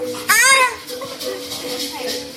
ahh

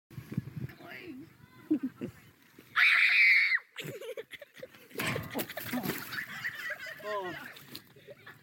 the big bang

